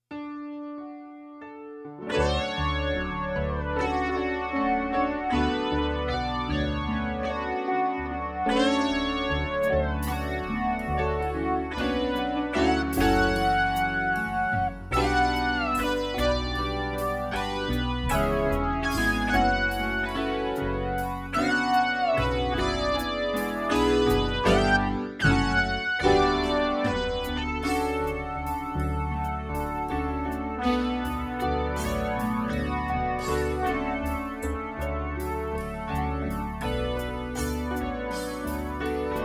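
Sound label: flanger with drums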